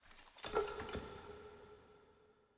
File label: ambience room debris; necromastery